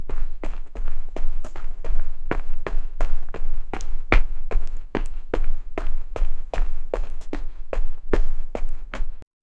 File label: running footsteps sound